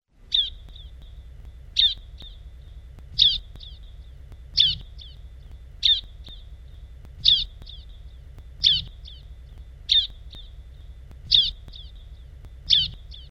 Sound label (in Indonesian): terapi ciblek macet